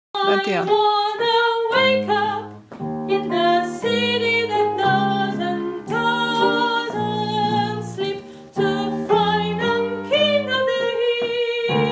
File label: alto